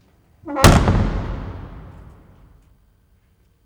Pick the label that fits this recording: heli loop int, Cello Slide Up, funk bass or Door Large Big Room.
Door Large Big Room